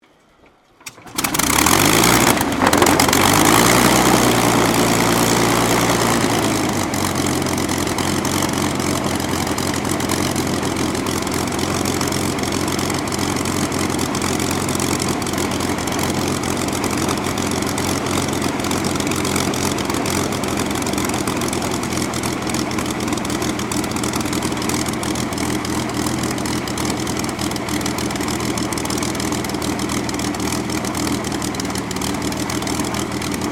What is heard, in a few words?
AIRCRAFT SUPERMARINE SPITFIRE MK-XVI STARTUP IDLE; Airplanes; Vehicles; Airplane Sound Effects